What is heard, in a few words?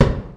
Flash Bulb Pop loud, balloon pop; Pop the Wheezil™ 1.0.3